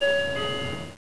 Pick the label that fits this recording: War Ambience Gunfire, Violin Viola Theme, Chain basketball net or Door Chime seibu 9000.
Door Chime seibu 9000